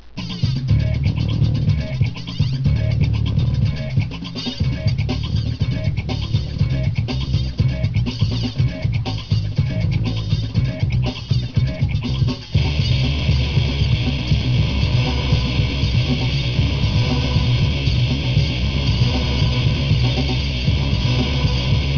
plop

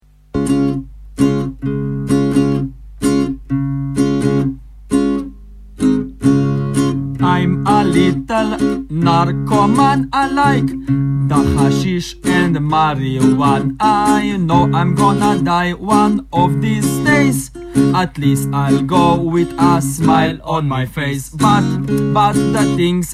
marihuana reggae

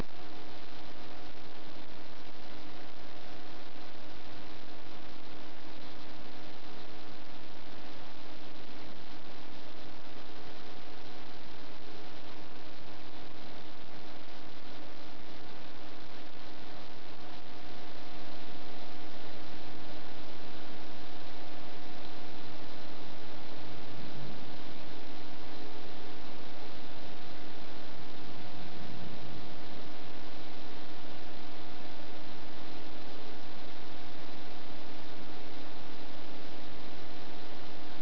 DRUM NET

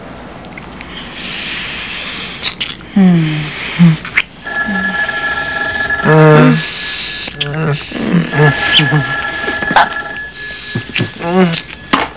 fone kiss; Athens; Olympus

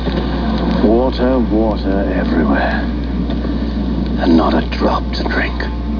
water

